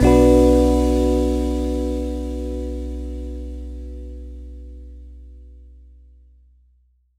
Retry Jingle

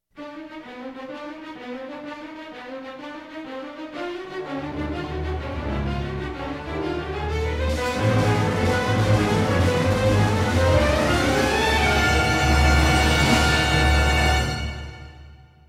suspence short; Chrome

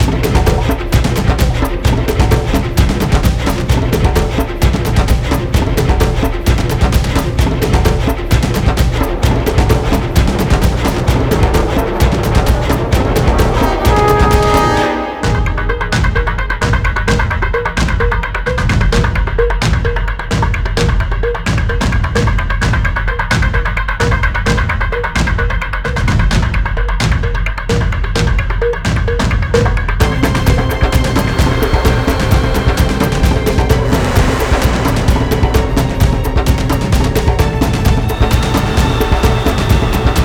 Intense Attack; dying light the following gamerip 2016

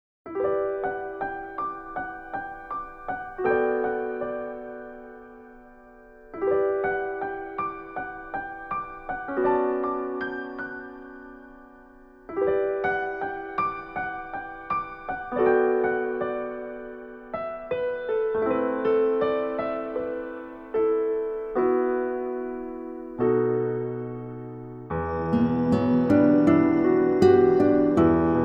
Story BGM; Phantom Breaker

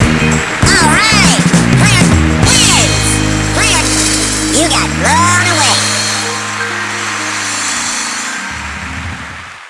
Battle Mode End (You got blown away)

